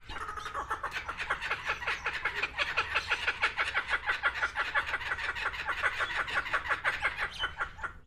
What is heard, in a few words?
short burst of a kookaburra laughing at lotherton hall bird garden